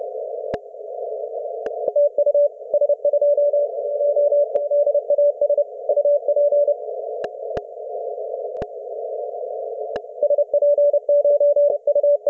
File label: CW; s21zas bangladesh st martin s island as 127 on 15m